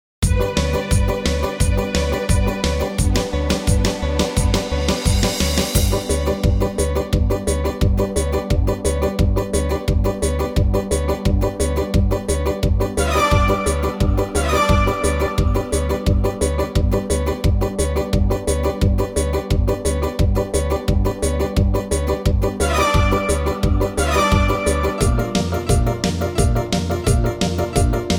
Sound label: modern piano minus